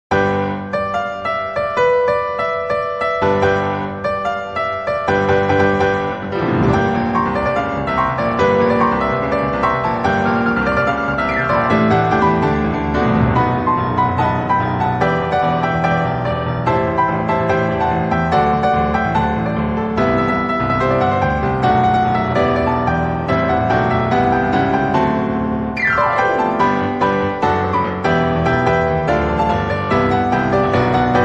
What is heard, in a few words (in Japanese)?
ピアノアレンジ; となりの吸血鬼さん OP; Tonari no kyuketsukisan OP(Piano)